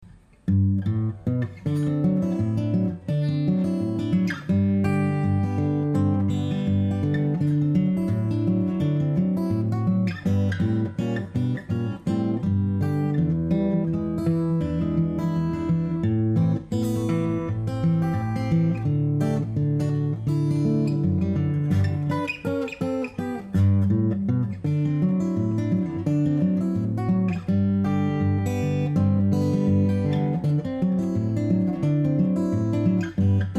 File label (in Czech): spanelska kytara